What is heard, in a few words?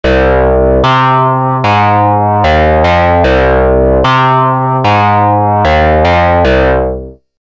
SWEEP RING, synthII; mfb synthII